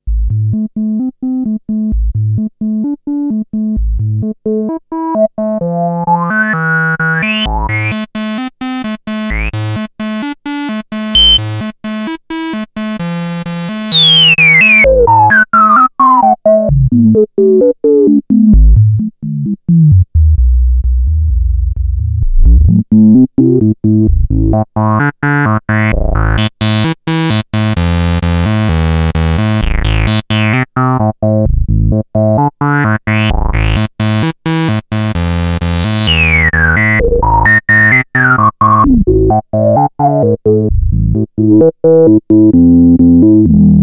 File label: Roland SH-09 VCF Sub Osc